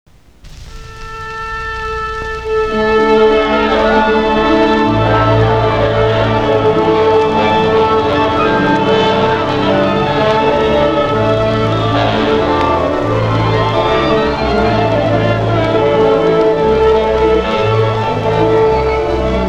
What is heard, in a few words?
Phantom Orchestra; Halloween Sound Effects